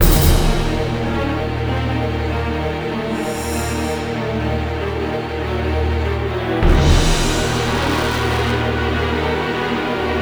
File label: boss battle; Shin Megami Tensei Imagine gamerip